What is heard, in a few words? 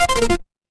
retro game game over